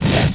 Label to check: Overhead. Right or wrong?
Wrong